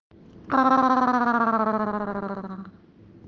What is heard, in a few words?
roar